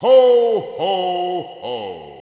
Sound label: hohoho; xmas; cstrike